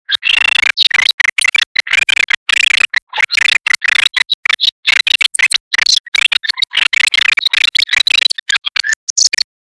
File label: a little cute robot giggl